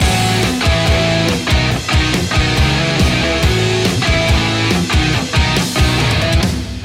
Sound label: the race loop